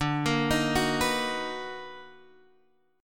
B Major 7th D; Bmaj7/D arpeggiated; JGuitar

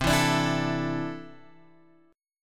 C 6th Add 9th C down Guitar Open G

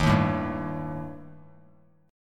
D sharp Major Flat 5th Bass Standard; strummed; 11,6,7,0; JGuitar

